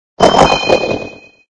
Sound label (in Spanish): Grito de Indeedee; Gritos de Pokémon de la octava generación